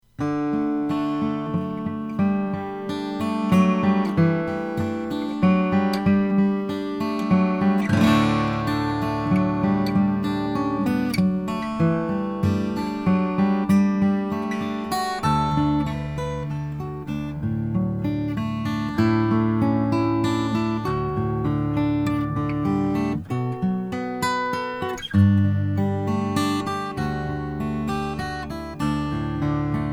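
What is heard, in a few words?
taylor 614ce big leaf maple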